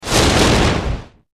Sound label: Fireball Flame Effec; fireballs